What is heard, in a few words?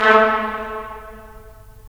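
brass trumpets staccato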